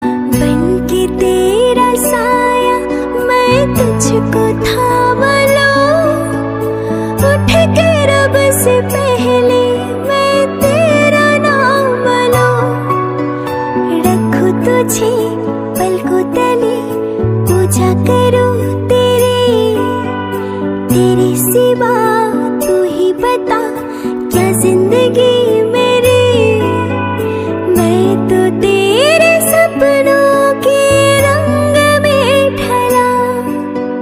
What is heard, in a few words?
Ringtone Female version